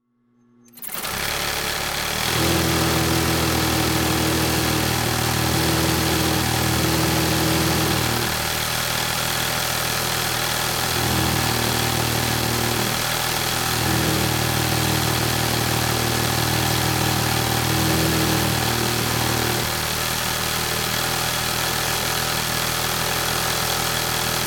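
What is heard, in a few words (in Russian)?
швейная машина промышленная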